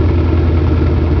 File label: machine